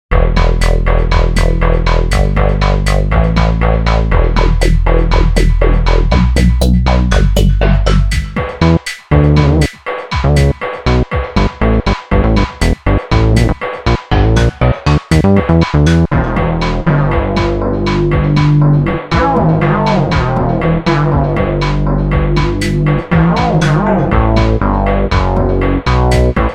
Drums and Six Basses; dreamsynth

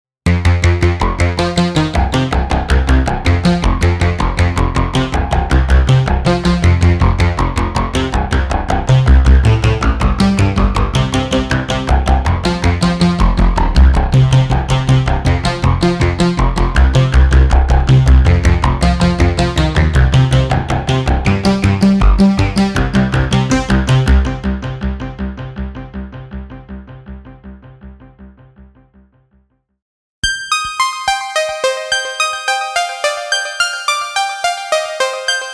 arps and plucks; atomika

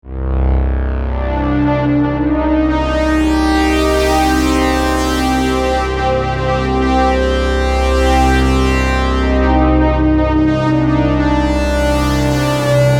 presets pads; atomika

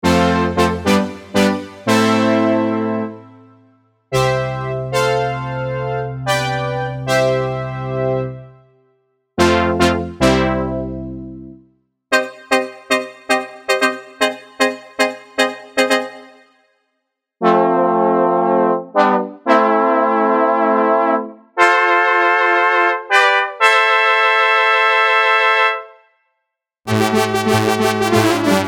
brass and strings; octave-cat

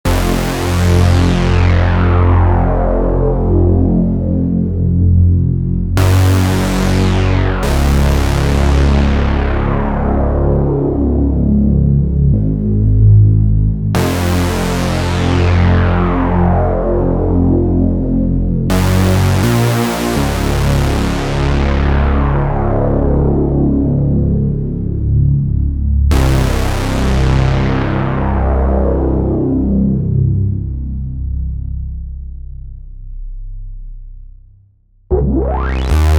basse and leads; atomika

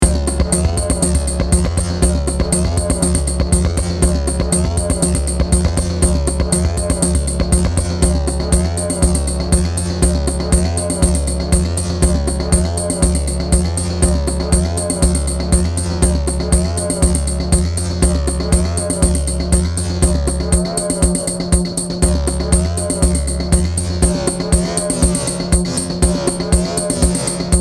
compurhythms; cr-78; ai robot ladies

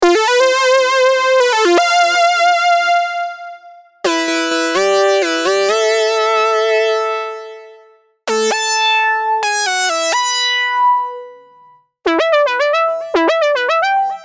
synth expander mod leads

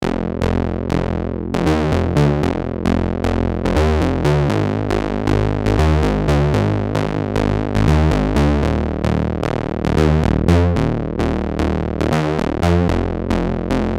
basses and leads, ps-3300

